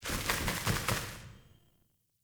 Sparrow Death State Five Wing Flaps; Animals

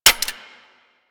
Pistol Mech; Kohm

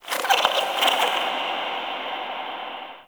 Under City Small Creature

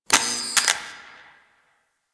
Light Flicker; Ambience; Props; Warframe; Orokin Derelict

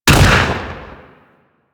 Hand Shotgun 2D Shot, Weapons

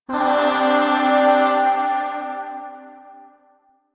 Shawzin Pent Maj Chord L; Music; Chords; Warframe; Zariman Void Shawzin; DE

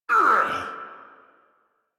pain; Warframe; Tyl Regor Boss; Efforts

